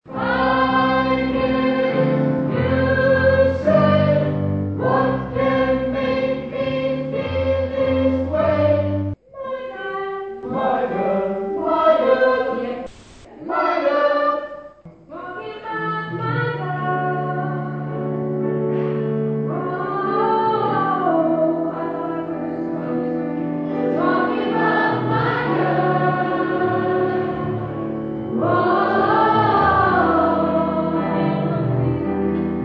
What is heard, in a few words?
tenor